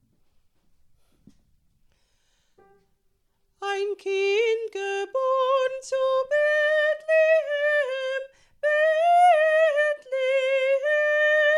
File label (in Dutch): Sopraanpartij